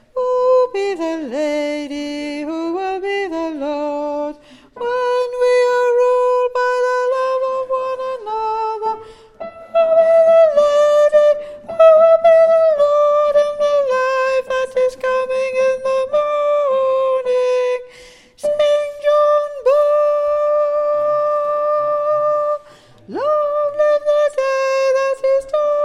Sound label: John Ball Ten